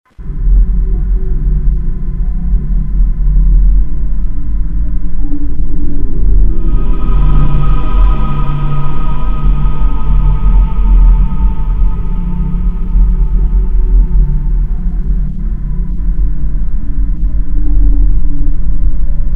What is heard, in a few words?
trail music